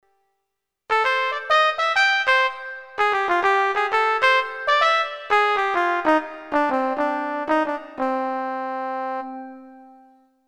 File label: Pianobox Trumpet